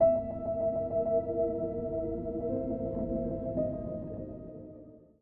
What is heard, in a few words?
Music Stinger Nightmare; LabyChloe Alt